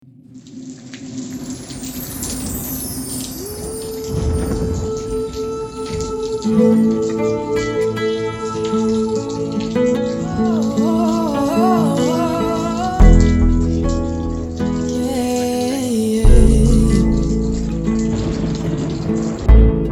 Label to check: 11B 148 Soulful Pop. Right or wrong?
Right